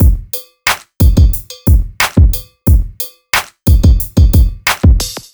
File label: Cow Bellz; Hip-Hop